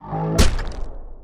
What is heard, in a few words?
Ice Buffalo Heavy Attack; Blind Quest - The Frost Demon